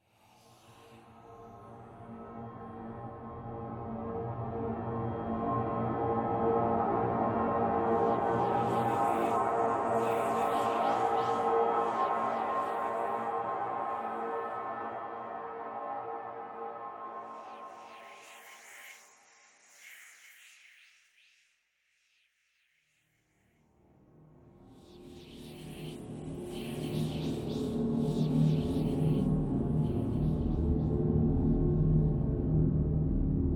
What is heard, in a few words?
port authority transhalls breath